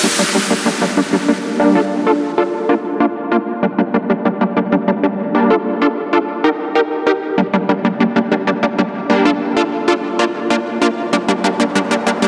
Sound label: Dubstep synth